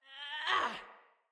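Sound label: Exertion Female; Battle Barks; Envoy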